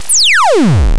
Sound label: laser; prosoundfx